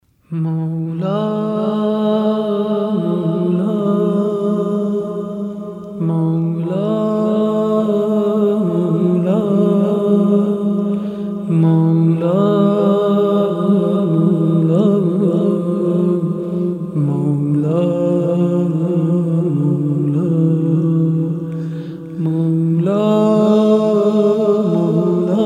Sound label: Vocal Ba Kalam; Vocal&Effect